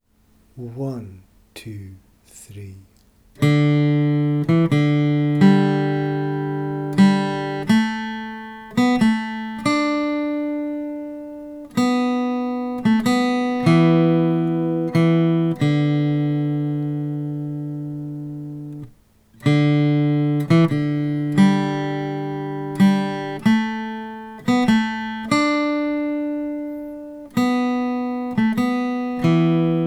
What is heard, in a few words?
Skye Boat Song Melody; A part